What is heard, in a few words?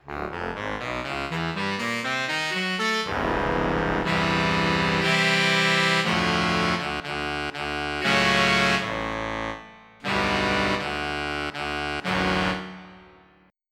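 Crisp Octaves, tenor sax; SoundFont - Traditional, Voice & Keys - Volume 2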